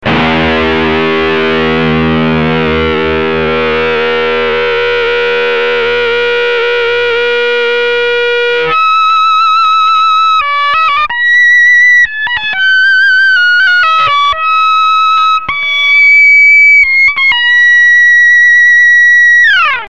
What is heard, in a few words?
sust drive harm, guitar; Fernandes ARS550; сустейнер в режиме гармоник на перегрузе, пример звукоизвлечения без щипка